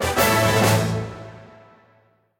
win small; robin hood

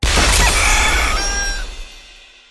rooster Open; eggomatic

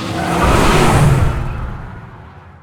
scatter Into Freespin; multiplier mayhem

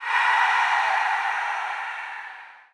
super mega win end; superstriker

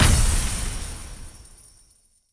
big win change; dazzle

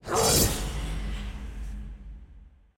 hell Character Win; archangels

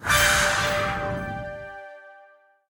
scatter Hit; butterflystaxx

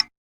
Intro Portal Click Sound; conan

